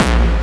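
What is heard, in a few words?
hard bass drums; Bazzdrumz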